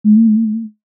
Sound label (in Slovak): gong